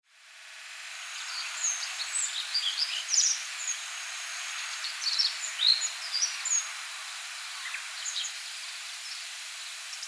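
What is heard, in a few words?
Little Bunting; koe; kohoaka; nagasaki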